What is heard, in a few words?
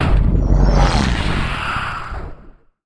Deep Impact Whoosh Out, stinger